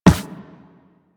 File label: Operator Run Metal, Footsteps; Warframe